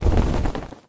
mantle

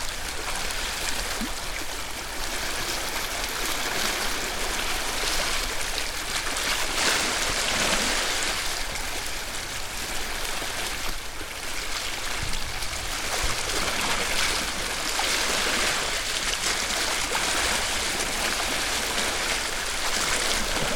wave

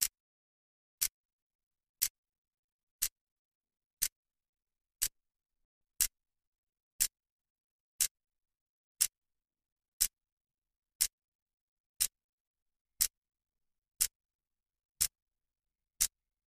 clock hand

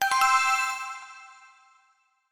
decision, button

